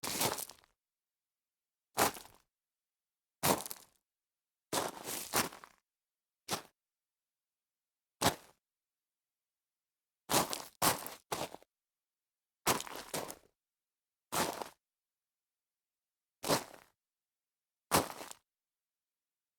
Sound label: scuffing on gravel